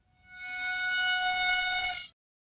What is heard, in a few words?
High Screech; SoundsCrate